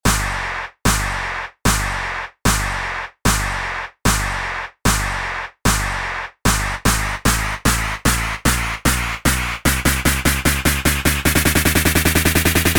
Postmodern Vibraphone; snarificator

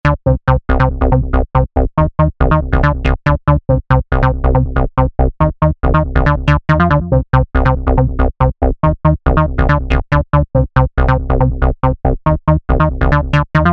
Pumpin Bass; abx3 trance presets vol1

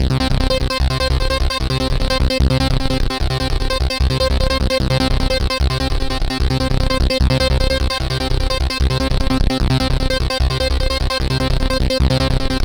Electrica Samba, acid techno trance; acid techno trance vol2

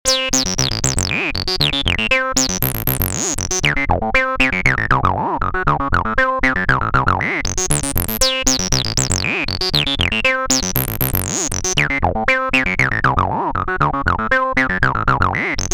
Acide Traxer, Acid House; Chicago House; acid house vol 1 128 presets for abx3

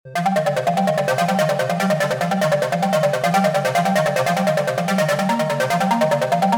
Painting Mallets, eurodance 90s; eurodance 90s vol1; Preset Bank for ABX3: EuroDance 90s Revival

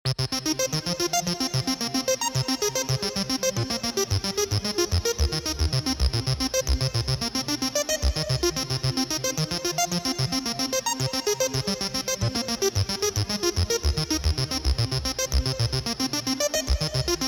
Trancy Sound Cold Notes; retro 80s synth wave; retro 80s synth wave presets abx3 vol1

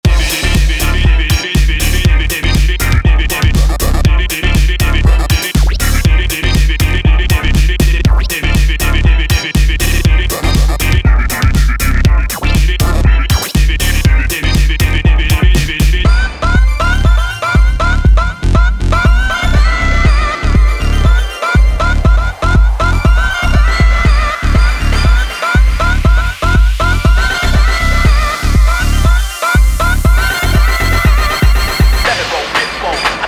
Presets with Drums; junorator

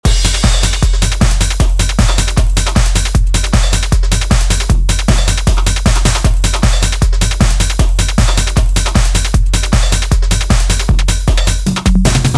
Techno; Drum Blaster: The Ultimate Beat Generator, Sampler Plugin for Modern Producers

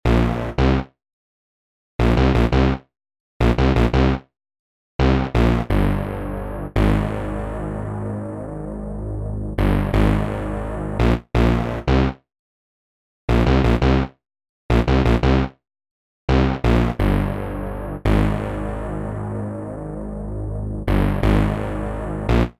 Bass Rumper, analogue stab

